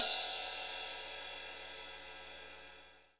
CYM RIDE, KIT Real-Kit

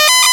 Vine Swinging; Pitfall - The Mayan Adventure

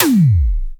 EFEX, FX DRUM

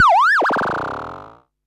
Perc Synth Raw

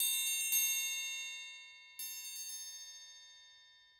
Triangle, Orchestral; Sonic Foundry (Sony Creative Software); Orchestral VOL-2; Modern 11

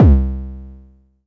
Low Kicks C2; LIMAT Div Dept; Drums

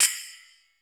DANCE CY; 90 sSampleCDs; Crypton - DrumBase5000; DANCE KITS

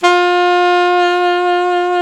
SAX ALTO MP; Roland LCDP07 Super Sax; Alto 414

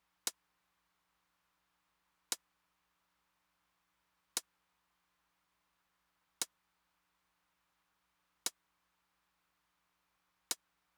closed hat, Roland TR-909; drum machine; Roland TR-909 Ableton Project

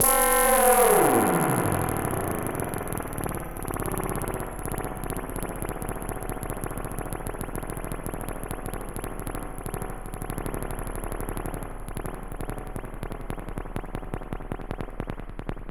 FX, EMS VCS3; Polyend; Simulacrum Sounds; 1 Shots; Synths

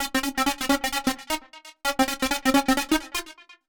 130 C, uk garage, Synths; 130bpm Lines n Loops

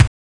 Kick, Genesis; M8

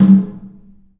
DRUM; The Piano; InVision Interactive

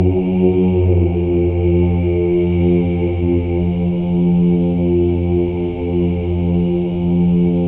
Voices

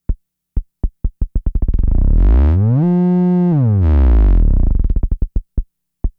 FX, Drum Machines; Fricke Schlagzwerg

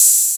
Yamaha CS6, Drums

